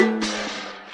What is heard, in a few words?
trashcan